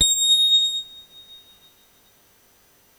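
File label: soft tines A#6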